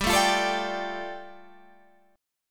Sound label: G 7th Suspended 2nd G